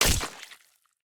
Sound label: Blade; Scrap Weapons; Zomboid